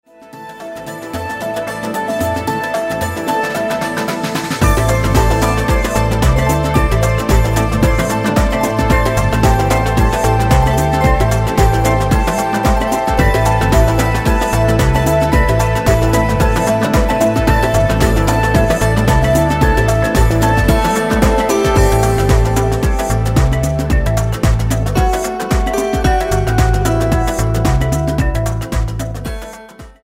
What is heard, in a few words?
Instrumental